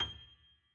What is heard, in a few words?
key